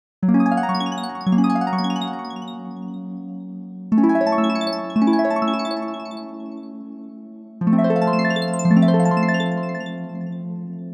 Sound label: quiet harp Maj13 Maj 7